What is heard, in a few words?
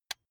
electric button drill direction switch lock soft